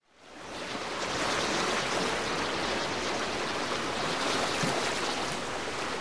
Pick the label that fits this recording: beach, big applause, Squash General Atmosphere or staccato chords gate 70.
beach